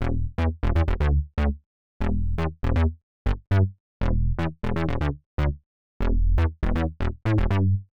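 Synth Bass E G, Nu Jazz House, Bass Loops, 120 bpm; PowerFX - Nu Jazz House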